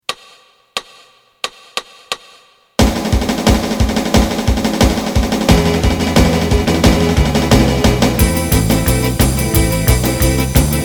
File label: lead